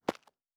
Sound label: Dirt Run Surface, Footsteps